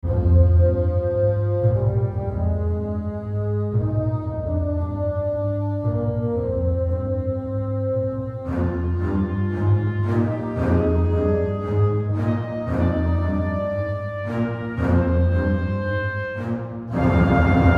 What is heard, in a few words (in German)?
Orchester Wood Horns